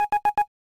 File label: Zoom Out; T390K Wine 3G; LG